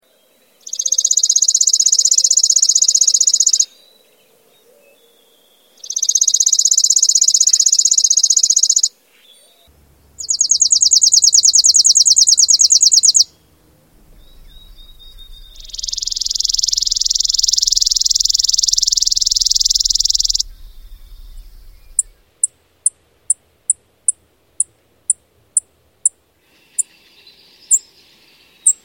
chipping sparrow; Spring thru Summer

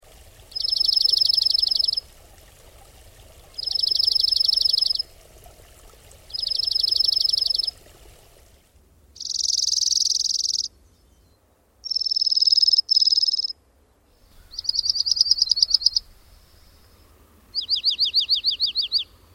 junco; Winter

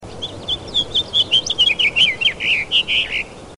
purple finch

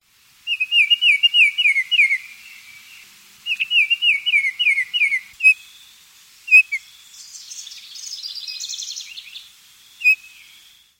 oriole; Baltimore Oriole; Spring thru Summer

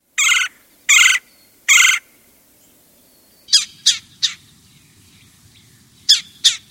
redbelly; Red-Bellied Woodpecker

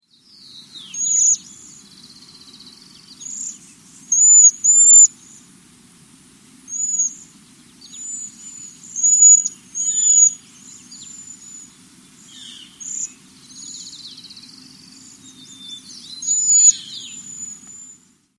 waxwing; Cedar Waxwing; Early Spring

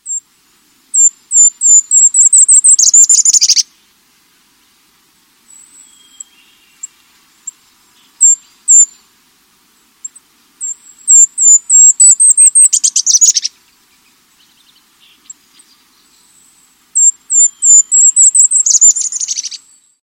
Gold Crowned Kinglet; Fall thru Spring

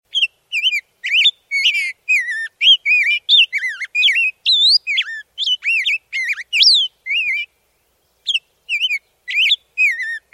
grossbeak; Rose Breasted Grossbeak; Late Spring thru Summer

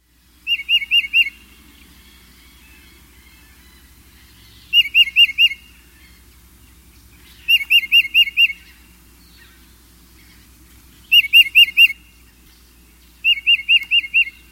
tufted titmouse

